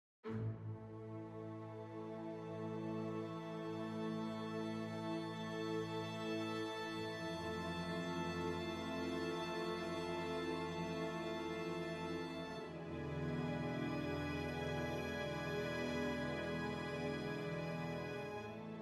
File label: Silence Pad Accent